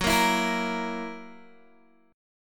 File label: Gb 7th Gb down Guitar DADGAD, chord